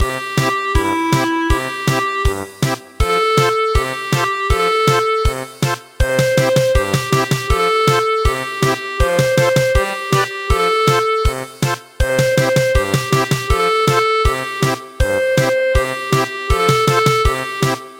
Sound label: German Polka